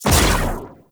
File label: Pulse Laser Shot; Warframe; Railjack; RailJack Weapons